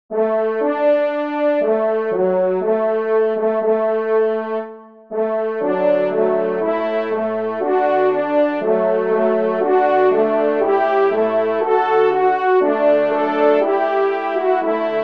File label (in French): Salut aux Sonneurs Ensemble; les conseils de mise en levres d'hubert klein